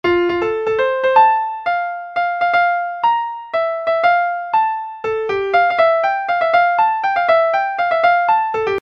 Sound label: new tyrolean waltz